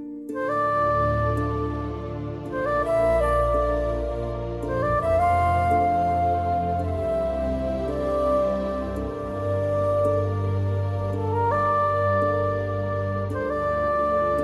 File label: Flute BGM Ringtone; Karthikeya 2